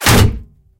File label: bullet hit inside; War Thunder